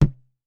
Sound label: Balloon Bounce; SteamVR; InteractionSystem; Longbow